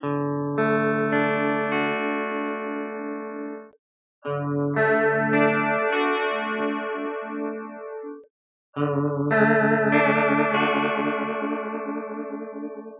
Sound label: Chorus effect